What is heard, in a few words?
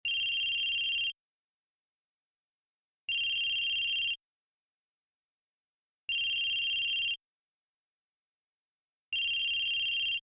cellphone; telephon